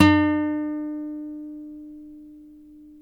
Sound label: NYL NV E, nylon no vib guitars; Spectrasonics; Hans Zimmer Guitars VOL-1